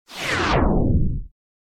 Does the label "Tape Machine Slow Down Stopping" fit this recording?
Yes